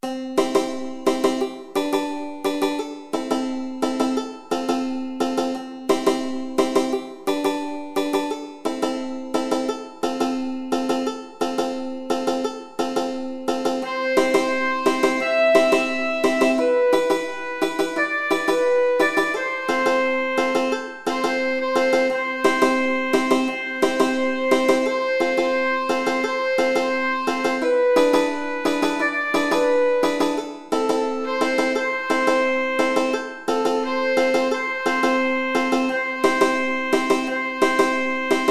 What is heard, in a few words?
Ukulele